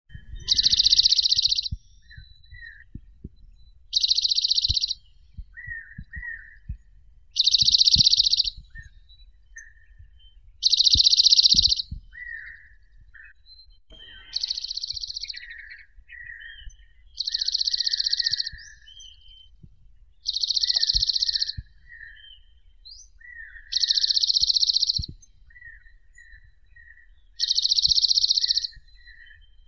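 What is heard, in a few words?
dark-eyed junco, Junco hyemalis; unko serii; zvuki prirodi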